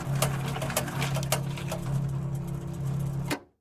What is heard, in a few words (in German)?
bankautomat refresh; minecraft